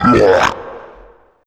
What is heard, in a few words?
fly cough; pestilence